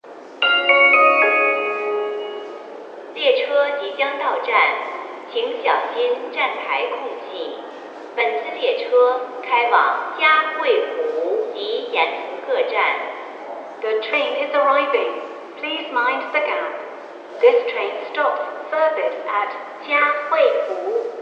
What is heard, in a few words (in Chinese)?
进站; 17号线-嘉会湖方向